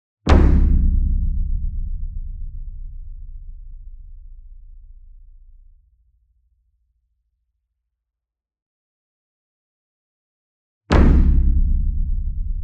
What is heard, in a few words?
Gong Flam Soft Beater Near; SINGLE DRUM; boom library; Cinematic Strikes Construction Kit